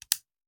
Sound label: Hand Gun Foley; Sound Ideas; Guns